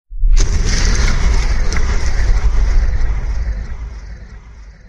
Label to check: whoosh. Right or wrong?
Right